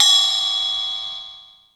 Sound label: Hip Hop, Drum Machines E-MU Pro-Cussion